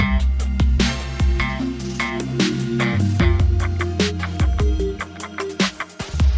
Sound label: Downpour; BB 2HTC Samples Vol 4